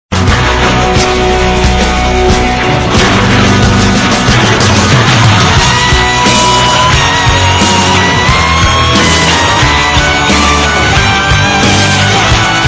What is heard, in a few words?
iphone 6 ringtone